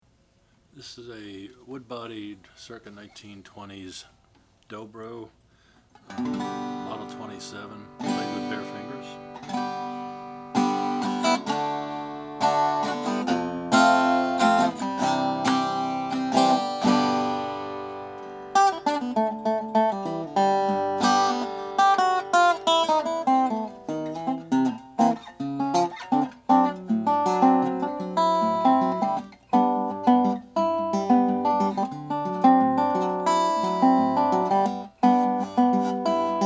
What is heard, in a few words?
regal dobro model 27; 1932; sunburst